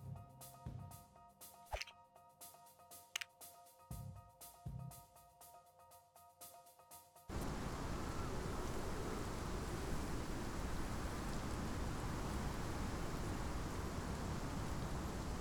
Newsreport SFX; lego the incredibles 2018 pc; Cutscenes - Hubdocks

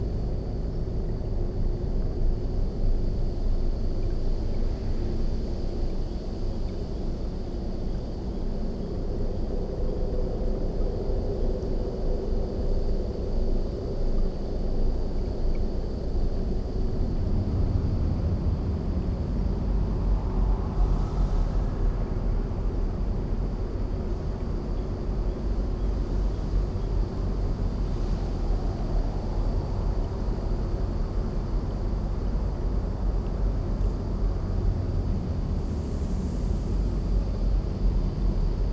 Ambient

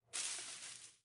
Bush Rustle; Lethal Company; Windows

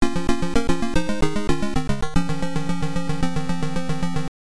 Start BGM

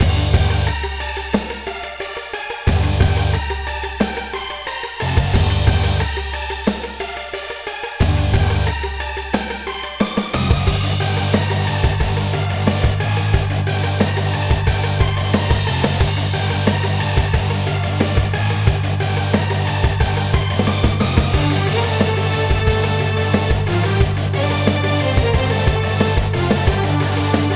Battle; rpg maker vx ace

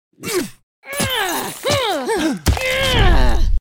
Witch Face Sit Attack; Goddess of Trampling

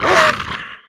Monster Wolf Fox type Death; Inotia 3

